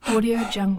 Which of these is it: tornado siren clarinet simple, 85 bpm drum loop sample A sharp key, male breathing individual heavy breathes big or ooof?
male breathing individual heavy breathes big